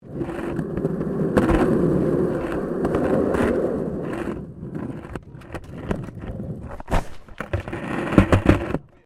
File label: SKATEBOARD UNDERSIDE CONCRETE; Skateboard sounds; Skateboarding; Sports